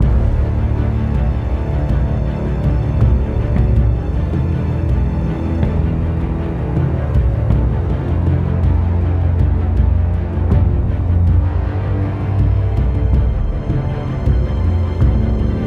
Loop Fight

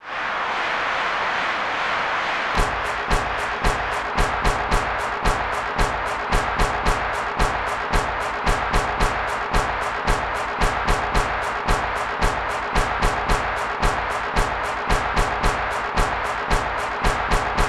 Soccer Game Ambience

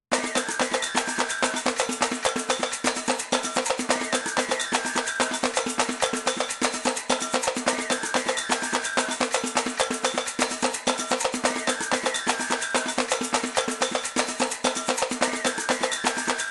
Samba; Kid Pix 3 Deluxe; Windows

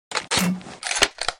Shotgun Reload; Nutcracker; Lethal Company

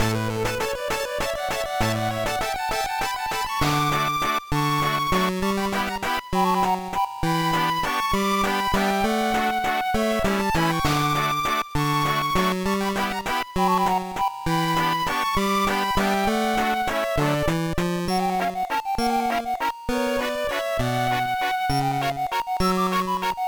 BGM; Kawa no Nushi Tsuri 5 Fushigi no Mori kara; gba

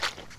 footstep sand; Don't Be Afraid The First Toy

